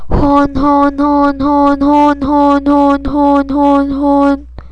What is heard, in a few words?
horn